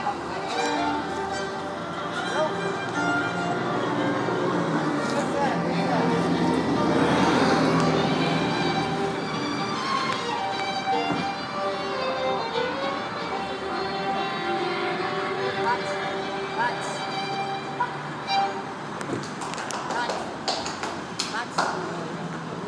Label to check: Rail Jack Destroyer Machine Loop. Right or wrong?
Wrong